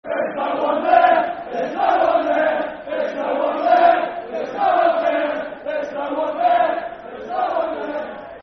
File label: There's No One There Football Chant (Soccer Song), Brighton; Brighton Bhafc